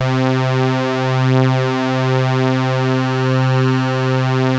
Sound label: TARK SAW, Synth